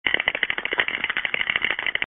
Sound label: eat dots; mr munch